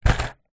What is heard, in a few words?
leisure table soccer game small set down on surface